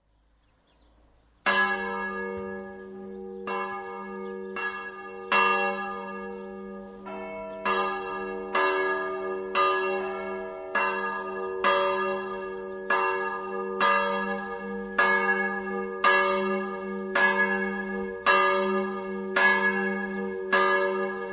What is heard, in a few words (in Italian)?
campane; villacaccia